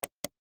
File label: click